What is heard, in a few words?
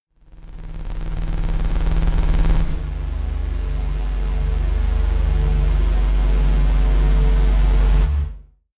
bass double swell, Digital; atmosphaeren umwelt